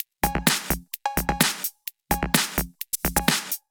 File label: Electro Loop 128BPM; Vengeance Samples